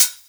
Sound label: Hhat, HiHat; Equipped Music; Loungin House